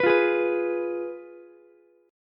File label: F Diminished Ukulele; Fdim strummed; JGuitar